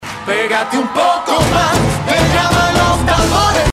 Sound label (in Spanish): tambores